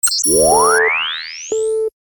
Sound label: powerup; Original TK Sounds